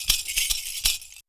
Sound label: perc fill; Univers Sons - Raricussions